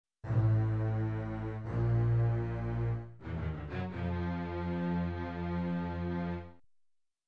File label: Dark orchestra strings